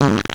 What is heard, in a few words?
Raspberry; Nuclear testing